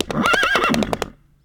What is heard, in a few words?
flinch; Rust